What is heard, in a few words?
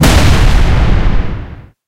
hit beans